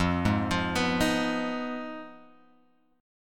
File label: F Minor 7th Flat 5th, Guitar, Open G